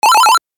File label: clear combo